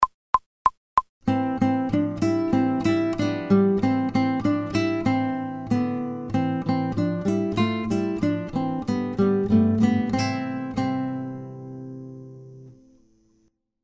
Yankee Doodle Dandy fast without metronome, guitar